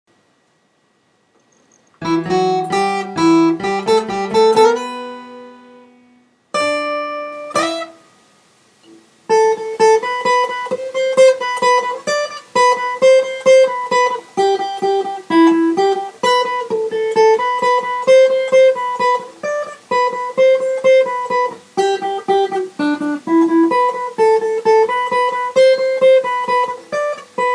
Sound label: Guitar Medley; Solo